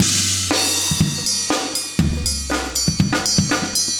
120, loops; Sound Effect Library

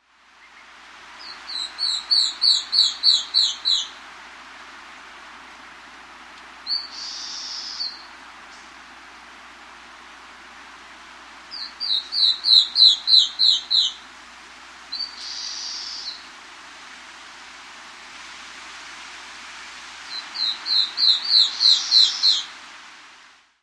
Star-Throated Antwren, S. America

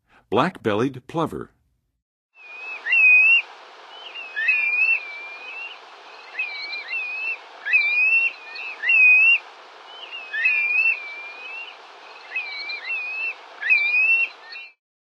Black-bellied Plover, Birds of North America; Western USA; Western USA Songbirds

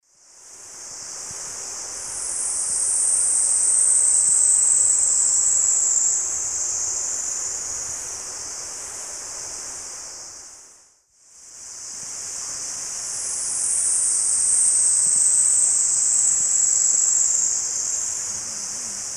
Heliodoxa schreibersii, song; S. America; Eastern Lowlands

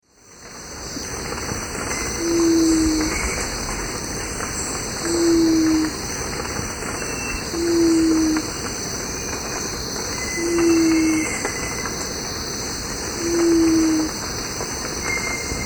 Geotrygon montana, song; S. America; Eastern Lowlands

